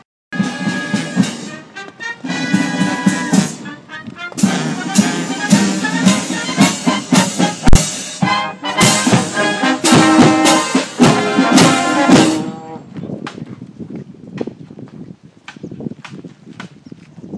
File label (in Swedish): marschorkester i orebro